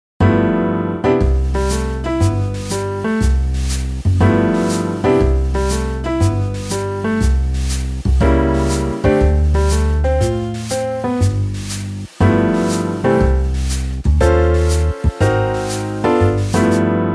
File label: Jazz